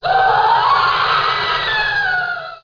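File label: scream female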